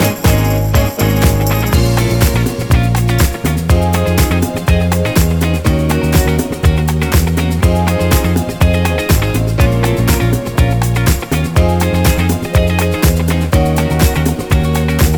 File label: The Funk Groove Loop; Meow Motors